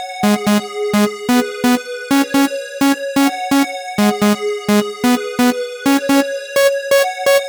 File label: Melody 128 BPM Root C#; Vengeance Samples; Melody Kits